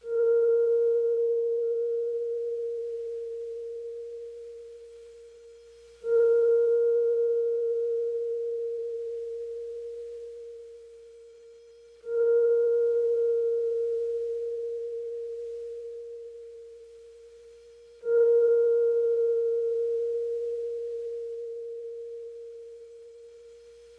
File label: 80 BPM C Min Old Rhodes, Lofi; Bordeaux